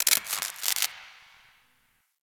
Derelict Spark One Off; Interior; Ambience; Warframe; Orokin Derelict

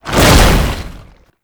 Tank Infested Swipe Whoosh; Enemies; Abilities; Lasrian Tank; Warframe

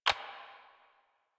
Computer Keyboard Stroke; Warframe; NineteenNinetyNine; Messenger

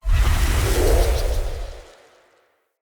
Wyld Invis Cast; Fey; Saboteur

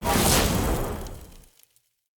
Short Sword Fire Whoosh; Weapons; Elemental

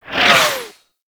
Tank Missile Barrage Whizzby; Enemies; Abilities; Lasrian Tank; Warframe

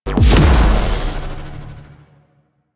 Alt Proj Explosion, Blastor Pistol; Warframe; Weapons; Corpus; Plinx

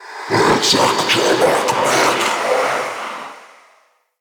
Mockery Male Vocal Charge Scream; Enemy; Mockeries

